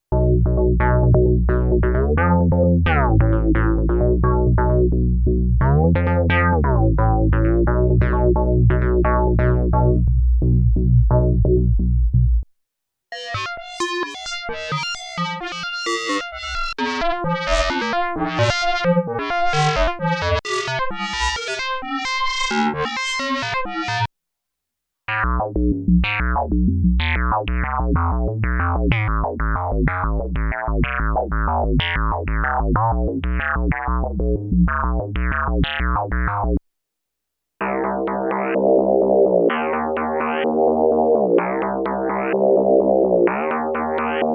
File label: Torsion Motion Presets